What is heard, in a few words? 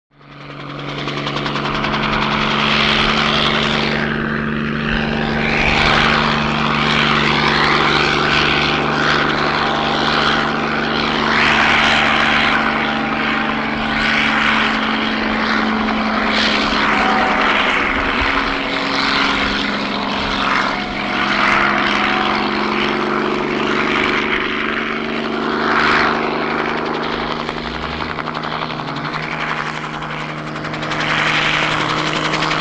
Helicopter Hover, jet ranger